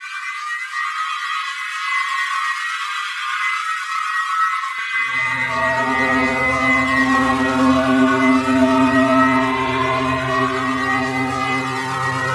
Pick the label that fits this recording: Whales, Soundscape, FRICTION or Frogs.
Soundscape